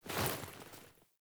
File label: Foley Action Default Dodge, Foley Default Clothing; Characters; Player